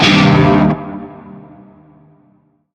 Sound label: Shawzin Pent Min Chord B; Warframe; Narmer